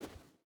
footstep rubber boots sand walk; Rust

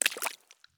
walk puddle; Escape from Tarkov

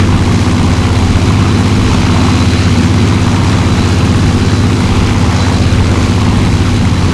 bomber rear, engines; War Thunder

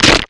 animal dog neck break; Call of Duty Modern Warfare

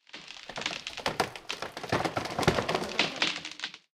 tree creak; War Thunder

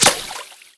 water bullet impact; Rust

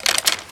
reload lift, foley; striker

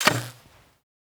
bow attack; Rust

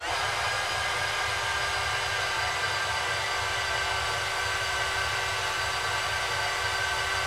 Aircrafts Air Inlet Screen; DCS

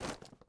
ui pickup tarp; Rust

